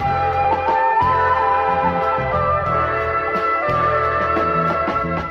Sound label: flute, Loops Phonky